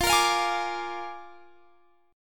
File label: A sharp 7th F, Guitar